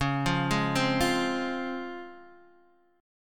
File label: C# Major 7th, C#